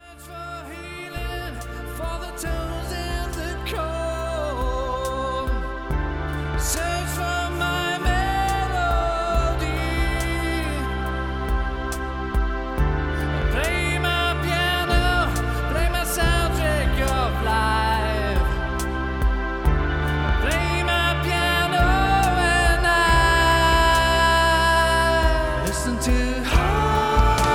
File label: Harmonies